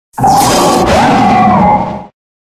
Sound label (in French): Cri Totémique; N2B2; Catégorie:Cri de Fulguris; Catégorie:Cri Pokémon (Noir et Blanc 2)